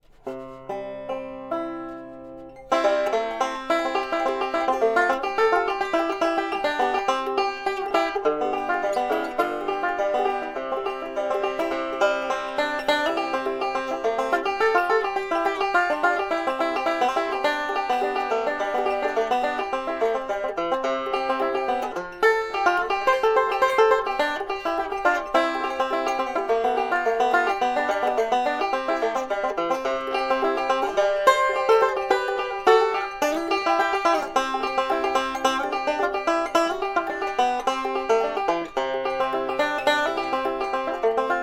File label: banjo, medium bright; Brightens after 7th fret; More brightness sustain would bring it closer to typical bluegrass tone.